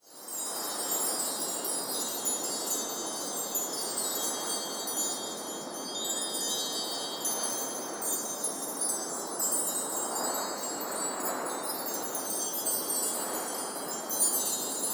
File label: Chimes in Blizzard; PremiumBeat